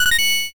short fanfare

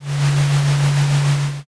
conveyor

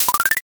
board flip

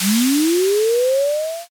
firework launch

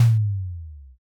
odaiko

